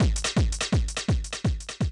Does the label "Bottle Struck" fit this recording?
No